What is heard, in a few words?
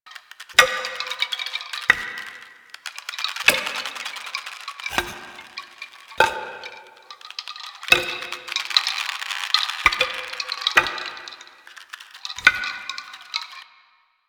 Wood Chimes Loop; Ambience; Props; Warframe; The New War; Zariman; Quests